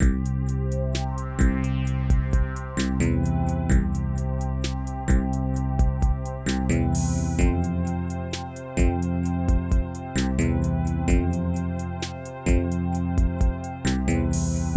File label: Loop Menu